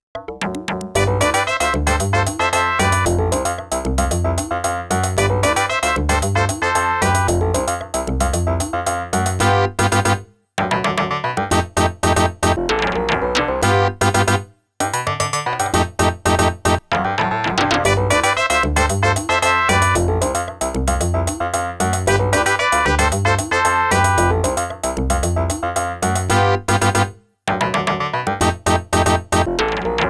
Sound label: SALSA; Galaga '88